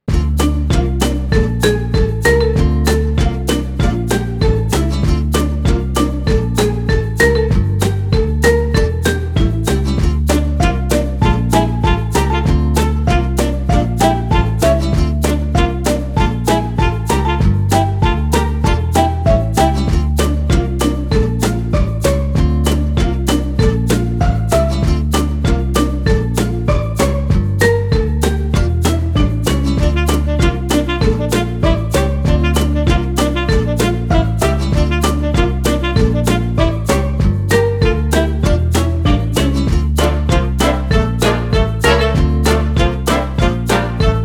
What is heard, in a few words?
cheerful sax; by burak sound bank; cheerful vol 7